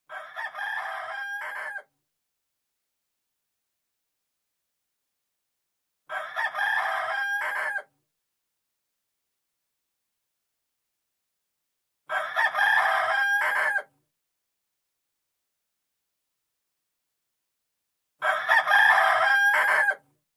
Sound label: rooster alarm ringtone